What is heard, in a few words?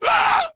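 male scream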